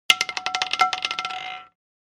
Casino game roulette ball bounces and stops

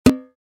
Cooking pan light hit impact; utensil sound effects

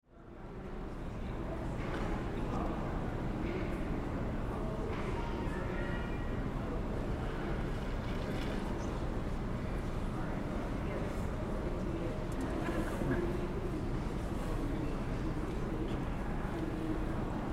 Airport ambience quiet interior gate

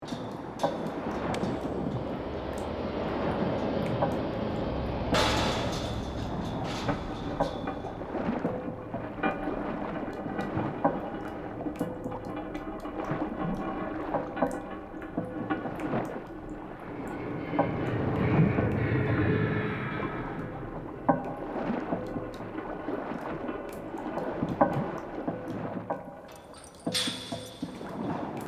Abandoned shipwreck ambience surface loop

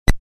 Soft muted beep

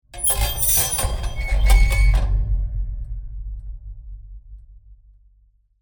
Laundry rack screeching with rattle and clang

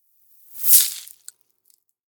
Cinematic transition freeze whoosh

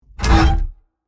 Double bass and wires designed hit

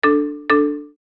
Smartphone ringing modern ringtone single

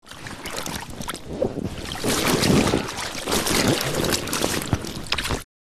Lilo inflatable mattress person movement in water

